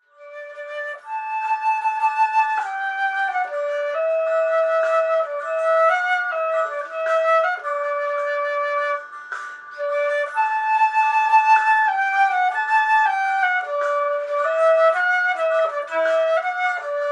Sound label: flute; goo goo